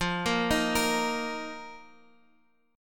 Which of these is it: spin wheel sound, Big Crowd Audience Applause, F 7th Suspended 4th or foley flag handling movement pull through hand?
F 7th Suspended 4th